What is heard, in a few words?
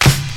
Nineties Clear Snare Single Hit C# Key, Royality free snare drum sample tuned to the C# note